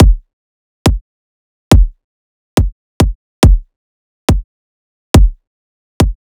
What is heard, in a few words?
Riddim Drum Loop 140 Kick; Ghosthack; Riddim Essentials Volume 2; Full Drum Loops